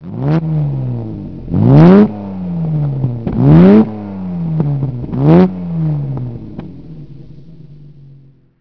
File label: aston db2 4